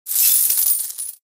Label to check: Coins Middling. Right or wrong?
Right